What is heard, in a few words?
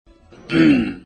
mmmmh; monster and cie